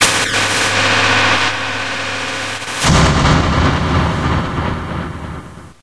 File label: nitrous, hazard ambient areas; hl2